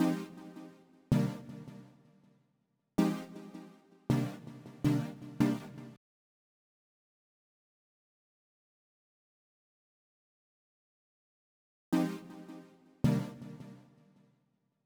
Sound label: 161 BPM C Min Synth, melody loop stem; Loop Stems; Cymatics; 2022 Melody Collection - Wavy; Location